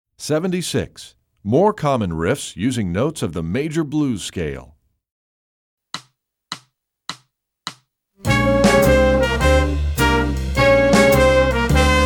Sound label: more common riffs using notes